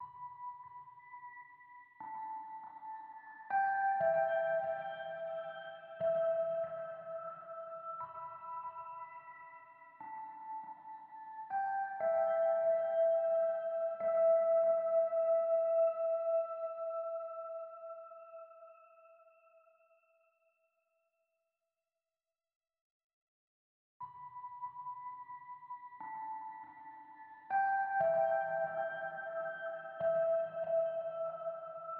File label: 120 BPM E Min Lead, Hip Hop; Melody Loops; Loop Stems; Cymatics; Hibiki